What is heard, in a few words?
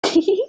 ihihihihihihihiih